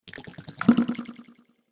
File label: Water Space Bubbles, sci fi; Water Sound Effect - Splish, Splash, Splosh!; Agcnf Media; Andrew Fleming